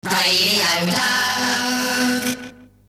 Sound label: radioduck sung